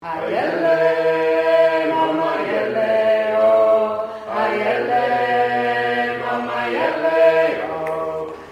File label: Bass